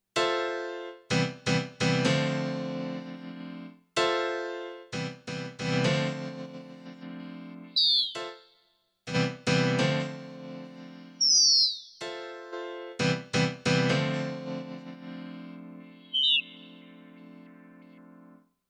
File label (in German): Triton Taktile Chord Scale mit TouchPad